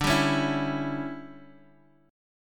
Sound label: D Major 7th Suspended 2nd Suspended 4th, Guitar, Half Step Down